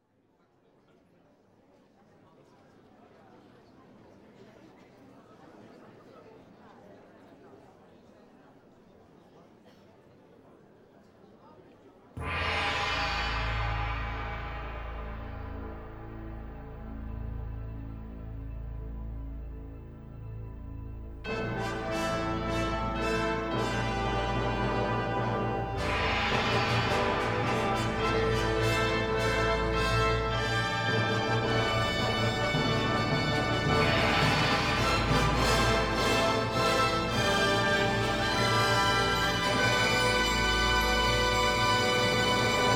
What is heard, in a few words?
FANFARE